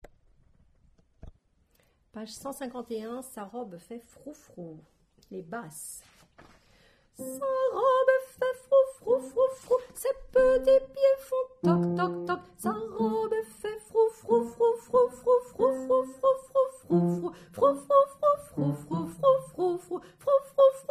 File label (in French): Hommes